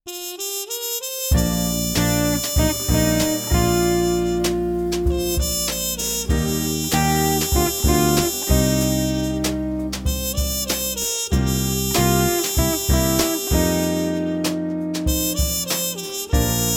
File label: Blues, Jazz Gitarre; Jazz Club